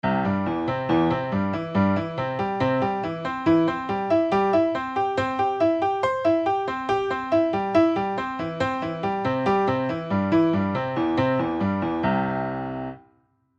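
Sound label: broken arpeggios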